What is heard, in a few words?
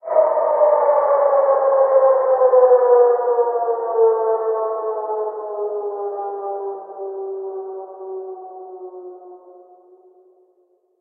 bomb fall; Sky Diving